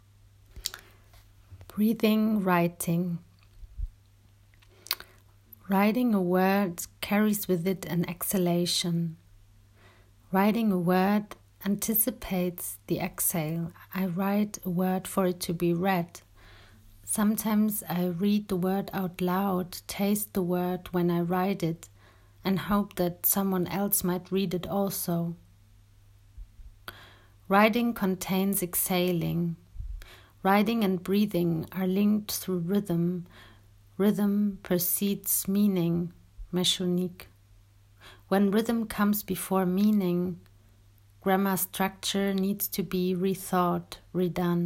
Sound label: breathing writing